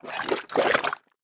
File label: Movement Large Wet; mvmt